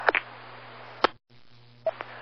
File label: Radio Click